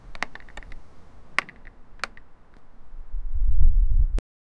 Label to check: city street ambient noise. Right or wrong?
Right